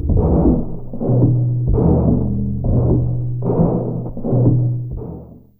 Rhythm, Fairlight CMI IIe, Percussn

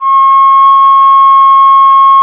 alto bansuri; Winds; Bansuris; E-MU Producer Series Vol. 7 – Old World Instruments

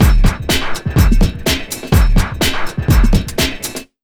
BEA, Big Beat Drumloops

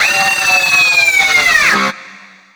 WHAM-O; Spectrasonic Distorted Reality 1

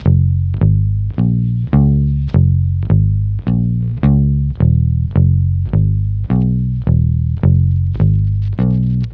Multi Guitar Swing Bass-F#, 105; Zero-G Kocktail Kollection; Construction Kitz (Lounge)

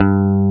G2 PICK BASS

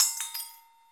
BOWL, METAL & WOOD; Roland - LA Composer vol. 1

